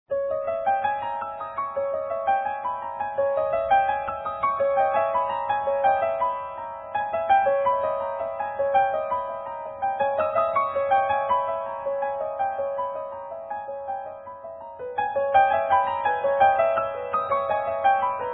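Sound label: Forte Piano, "August Forster" Grand Piano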